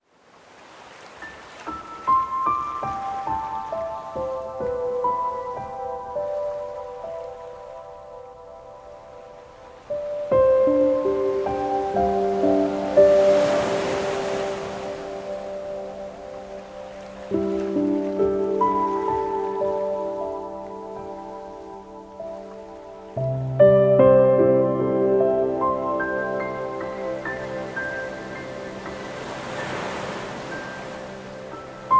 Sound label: piano